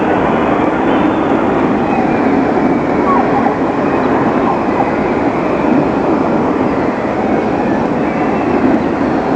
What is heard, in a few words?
birds